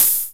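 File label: electronic sizzle; E-MU Formula 4000 Series Vol. 2; OrbitPercMaster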